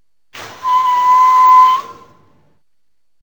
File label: walrus; arctic; arctic life